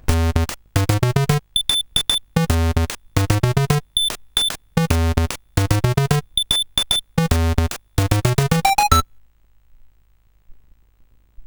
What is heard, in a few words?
sid, CSG8580R5; Coco-Notes